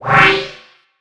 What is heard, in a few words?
halo gun draw, weapons